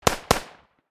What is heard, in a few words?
AK 47 firing multiple bursts